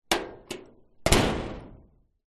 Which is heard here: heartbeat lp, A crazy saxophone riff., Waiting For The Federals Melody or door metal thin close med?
door metal thin close med